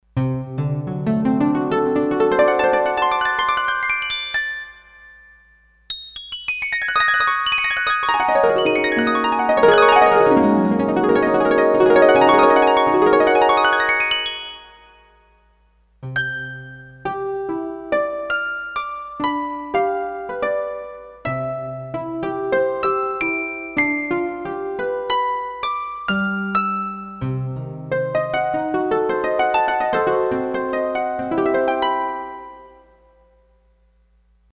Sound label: Rain Harp, Roland D-10; Factory Patches